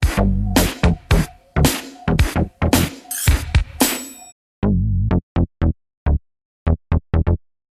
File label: boogie bass; Minimoog; Cypher; Diva